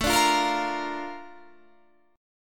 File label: Bb Major A, chord; Bb/A; strummed